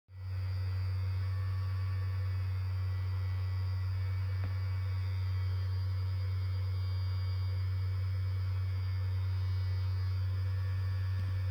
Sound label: magic wand; europe